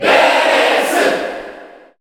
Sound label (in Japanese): Female Cheer Japanese; Byleth; SSBU